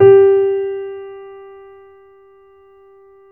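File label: G4, grand piano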